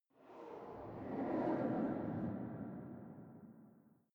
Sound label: fast ropes